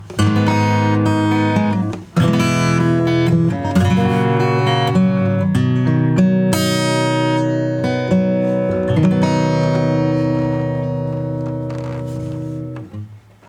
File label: acoustic; VLA-FET